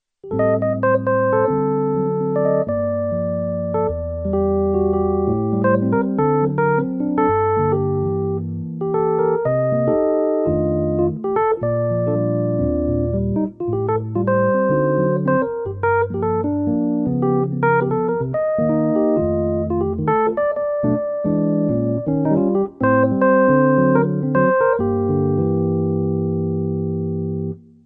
physis rhodes

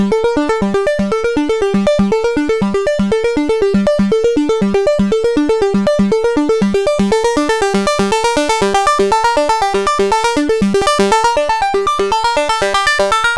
Sequence Sync

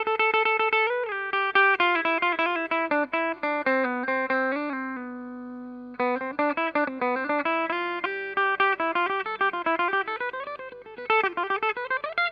Ibanez Artcore

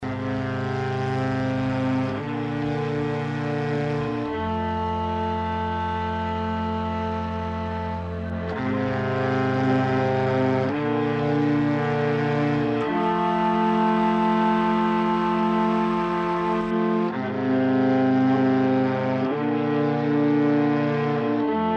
Focus String

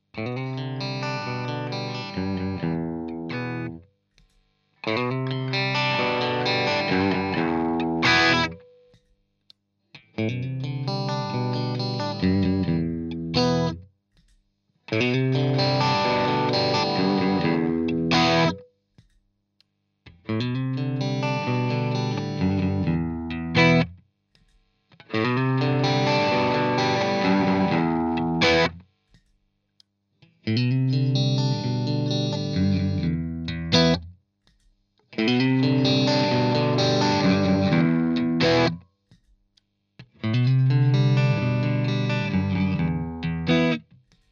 Screamer CH1 12 Tone 12 Gain all positions Arps; JHS

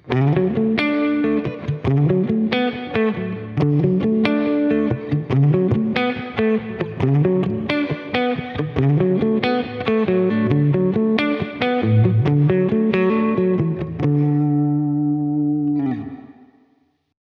Reverb Tone Full; Prussian Blue

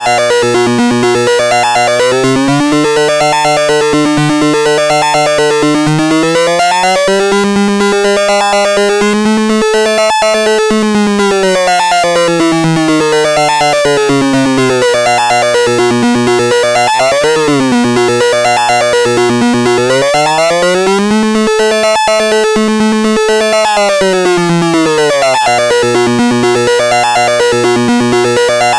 Module OSC Sync Sounds; GRP

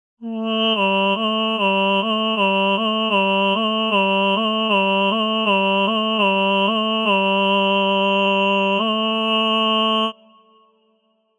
Vocal Legato Fast Slow medieval tenor; ERA II Vocal Codex

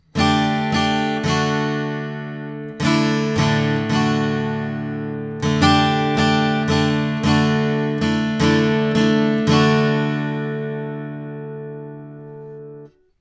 Guitar U87

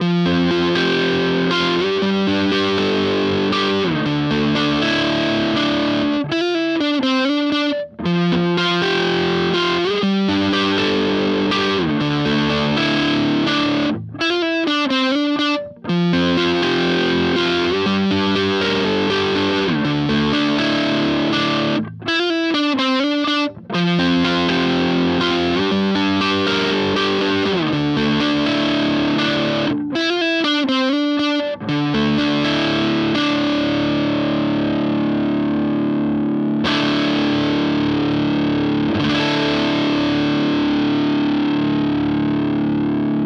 Gibson Les Paul Studio Neck

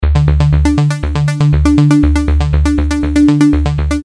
Arp; Arp-this

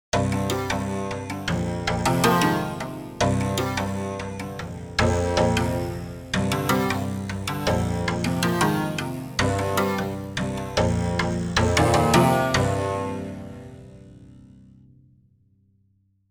Psaltery

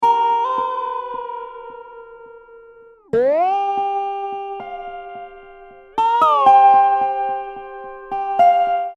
Dobro Pitch Contour

